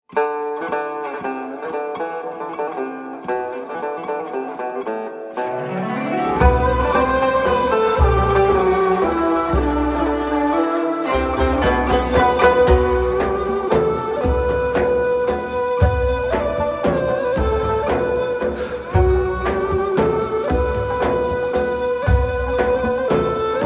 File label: Awake (Instrumental)